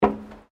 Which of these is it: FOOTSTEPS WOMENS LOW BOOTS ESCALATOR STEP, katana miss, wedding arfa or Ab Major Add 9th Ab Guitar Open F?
FOOTSTEPS WOMENS LOW BOOTS ESCALATOR STEP